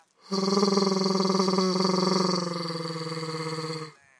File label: my yawn